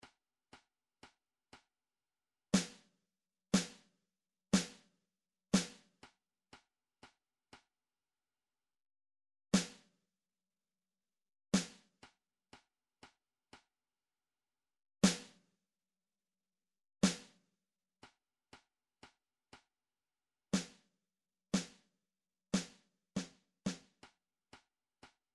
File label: drum trax snar; rumtrax library